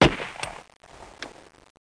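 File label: Shotgun; GEOGRAFIA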